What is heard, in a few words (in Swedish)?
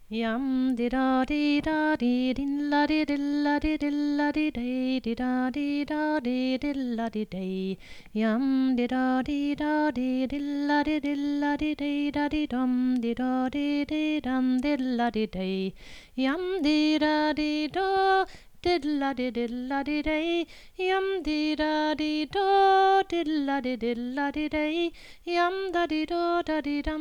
Polsketrall